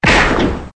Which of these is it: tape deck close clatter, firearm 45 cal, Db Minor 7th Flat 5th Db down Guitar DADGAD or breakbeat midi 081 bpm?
firearm 45 cal